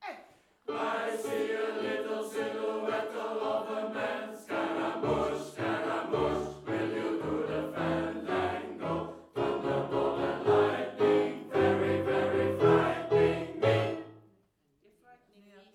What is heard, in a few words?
Bohemian SATB